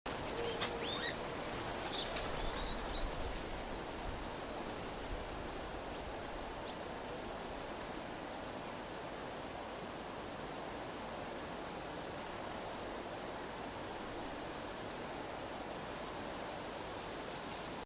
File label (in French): oiseaux buissonnant